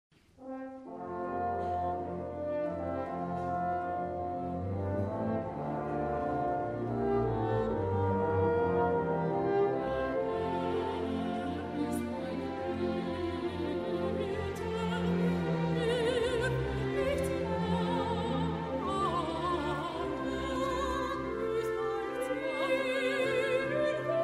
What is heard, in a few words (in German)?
Soli Chor; Erntefeier; Der Herr ist mein Hirte